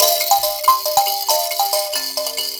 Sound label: VIB KALIMP, VIB KALIM